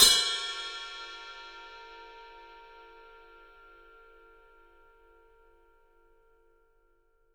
Studio Ride; E-MU Producer Series Vol. 5 – 3-D Audio Collection; 3D Percussives

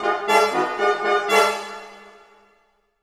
Orchestral Loops; 128 VERTIGO

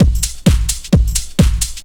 drum 130 trance

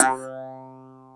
throat, JD8 attack

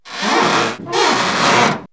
Rocking Chair; X-Static Goldmine

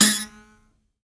MBIRA G#3; BS MBIRA2; Heart of Africa

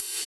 Ride; Equipped Music; Loungin House

